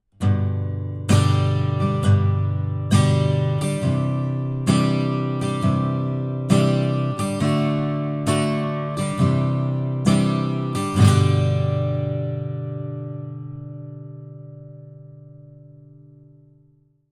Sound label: AccGuitar - R121 BiDirect - Edge modelled; bidirectional (osmička); edge duo srovnavaci test